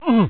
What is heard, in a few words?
uuooh